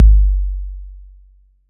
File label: BD 808; Wizoo - Platinum 24 Electronic; CHR BD 808